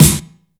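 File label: EFEX snare drum, FX DRUM; AKAI S6000 CD-ROM - Volume 1; DRUM RYTHM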